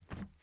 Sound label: plastic jump